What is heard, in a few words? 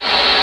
SPACE MOTOR, SF EFFECTS; Q Up Arts; Dream Experience